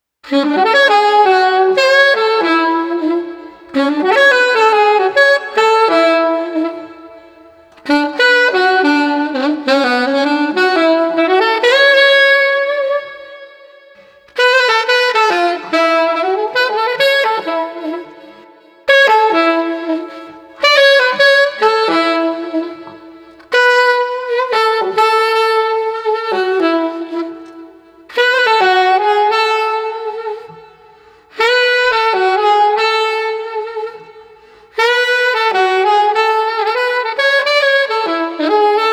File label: Sax Longing 140